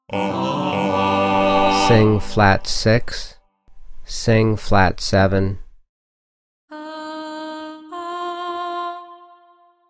G major Sing b6 and b7 Ascending Alto; contextual ear training voice edition 2

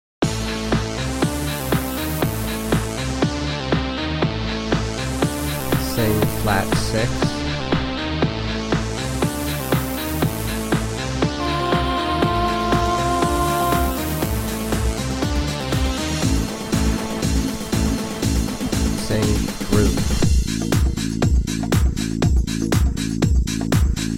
Alto Key of A, dance and techno music; Dance and Techno Music Ear Training; Practice Perfect

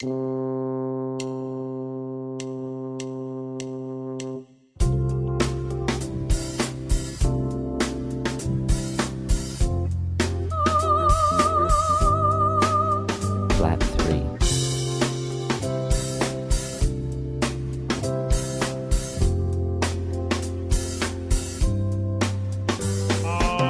Slash Chords Funk; slash chord ear training; Practice Perfect

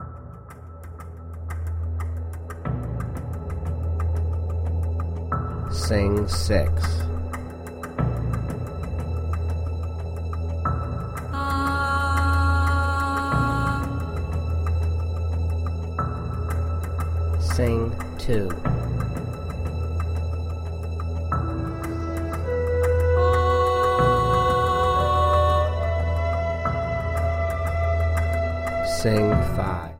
Alto Key of E; real world music ear training

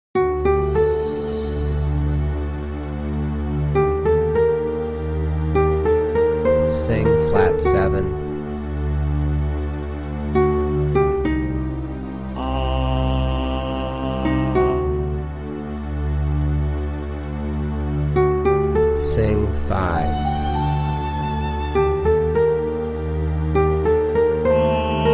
Tenor Key of D; applied ear training; Practice Perfect; Contemporary Orchestration Music Ear Training

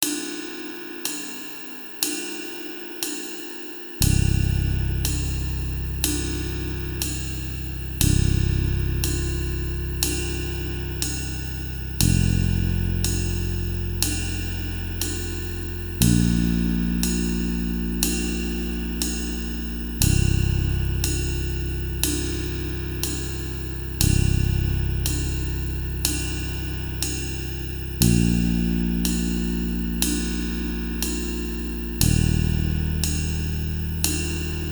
60 BPM BASS AND DRUMS ONLY; applying pitch class set chord voicings

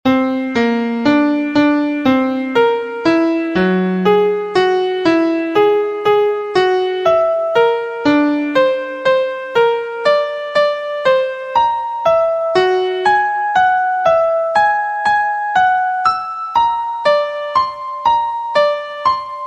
Degree 1 2 3 b5 b6 b7 Atomic Scale Key Bb; harmonic and melodic equivalence; trichord pair

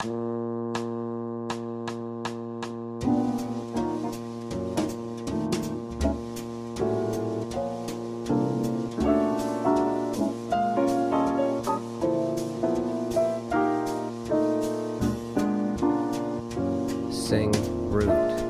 Bb Rhythm Piano Drone Sing Tenor; rhythm changes ear training; Applied Jazz Ear Training; Practice Perfect

